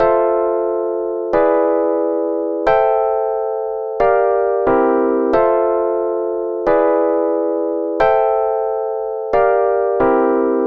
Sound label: Chord Sweet FM7 E7 Am7 Gm7 C7